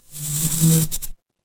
animal insect

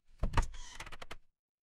door open

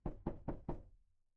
door knock

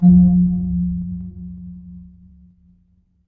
magic scifi shield

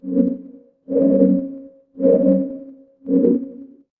magic scifi alien

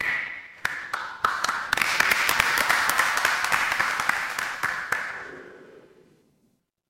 crowd applause

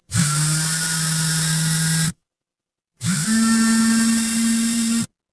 office phone vibrate

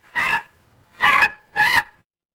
mechanical tire screech

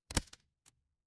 office stapler

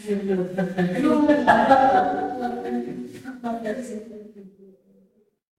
crowd laughter

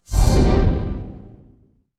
magic scifi heal

